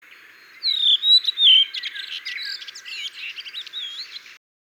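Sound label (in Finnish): Turili, Iso-Huopalahti. Tällä yksilöllä pitkä loppulivertely.; Espoon - Helsingin raja-alueilta alkukesästä 2008; Linnut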